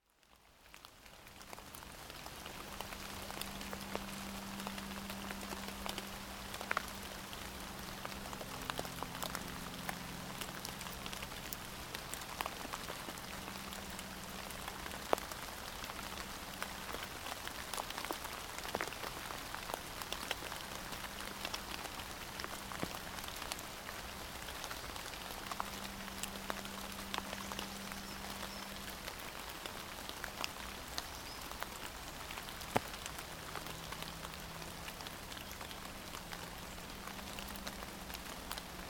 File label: January Forest Rain